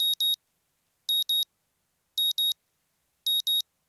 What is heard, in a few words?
alarm